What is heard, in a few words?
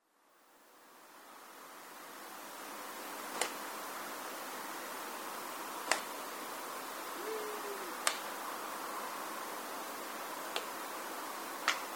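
long-eared owl, tendo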